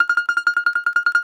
BAGLAMA T1FR; E-MU Producer Series Vol. 7 – Old World Instruments

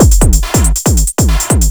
Beat, Drums, Eclectic Electronica

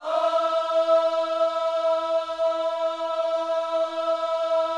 OHS E 4E, Gospel Ohs; Spectrasonics; Vocal Planet

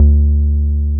303 SUB D3P, SUB BASS

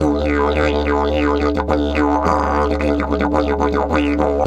108, Didgeridoo

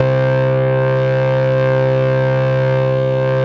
SYN ANALOG; Sound & Vision - Gigapack I CD 2 (Roland)

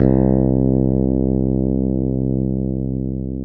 FING, BASS; Soli-Music; World of Synthesizers VOL-1

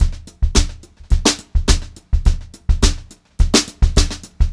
beat, Brushed Drum Loops; USB Soundscan vol.39; AKAI

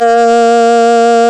REED A#; Big Fish Audio; Synclav FM

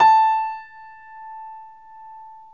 PNO XYPNO, Grand Pno, Roland

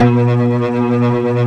ORGAN; Greytsounds; Scott Peers Sound Engineering

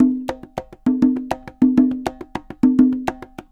Congas, Festa Latina, Drums & Percussion

